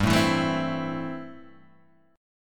A Minor G, guitar

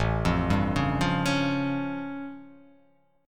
A Augmented 7th A; 5,8,5,6,5,8,x,x

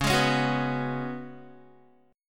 Db 7th Suspended 4th Db down Guitar; strummed

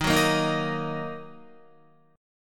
Eb Minor Guitar; Ebm strummed; JGuitar

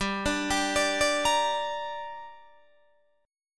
G Minor G down Guitar Open D, chord

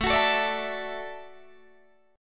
D-Major Add 9th A down Guitar Standard; strummed; JGuitar

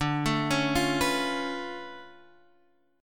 D Minor Major 13th, down, Guitar, Drop D

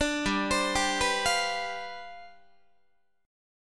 G 11th, Guitar; G11 arpeggiated; 17,15,15,17,15,16

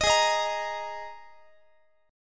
Gsharp Suspended 2nd Gsharp Guitar; strummed; x,x,18,18,19,21; 4A,4E,5A,5D,5G#,6C#

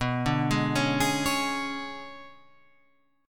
B Minor 6th Add 9th B down Guitar Open F; Bm6add9 arpeggiated; JGuitar

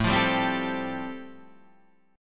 G 7th Suspended 2nd Suspended 4th A

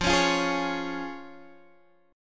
F Minor Sharp 5th, F, Guitar, Standard; strummed; x,8,11,10,9,9; JGuitar

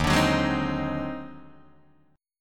Eb Minor Major 13th, Guitar, DADGAD; EbmM13; strummed; JGuitar

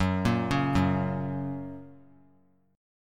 F sharp Major; arpeggiated; JGuitar

